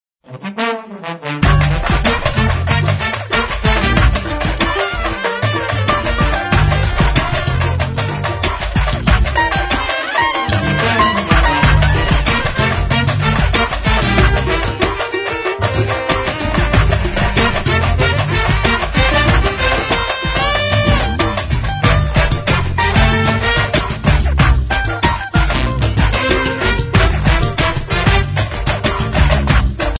samba funk

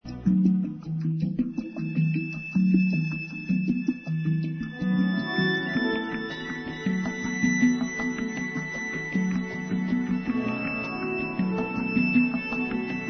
instrumental

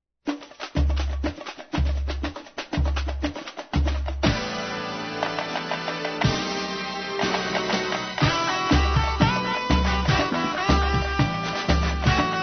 instrumental

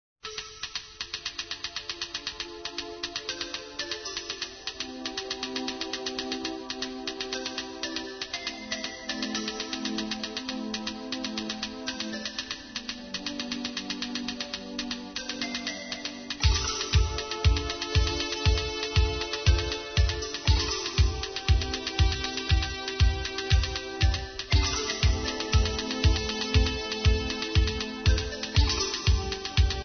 latin motion

